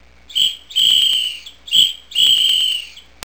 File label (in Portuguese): Apito